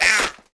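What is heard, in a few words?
book damage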